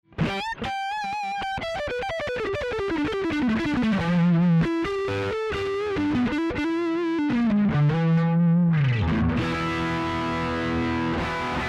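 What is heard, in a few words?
Lead DI Balanced Out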